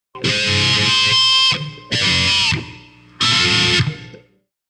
Rawking distorted electric guitar solo LOOP, Cm; Distorted Guitar Riff in Cm Loop; Part of Guitars (Sound Effects:Musical)

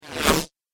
Flashback Transition Whoosh

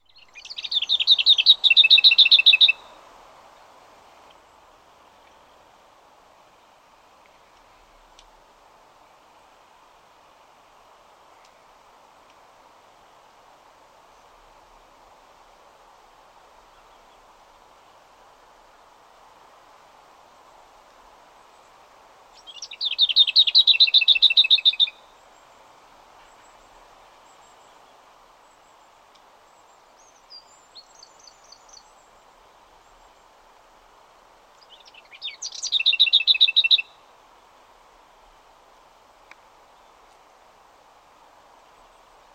Lesser Whitethroat singing; Part of Birds (Sound Effects:Animals); BBC

